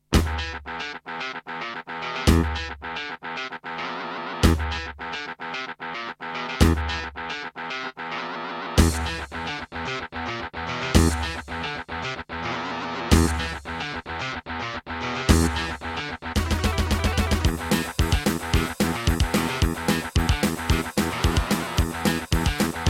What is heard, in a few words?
Terratec WaveSystem SCW001-2; Doom - E1M1